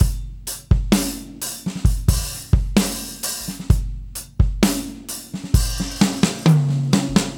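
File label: 65 bpm, beat loops; Zero-G; Monster Beats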